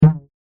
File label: Mass Sound Middle C; gravity-force-lab-basics